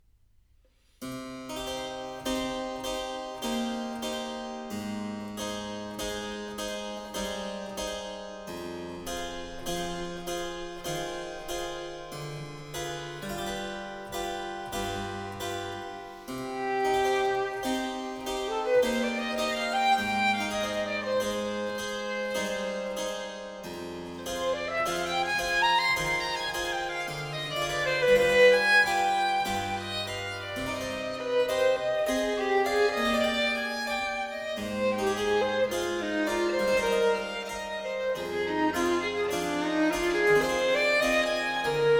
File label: Sonata No. 3 in E Major, Adagio ma non tanto, Violin and Harpsichord; BWV 1016; Bach; J.S. Bach